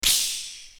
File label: Shot; Assemblee SFX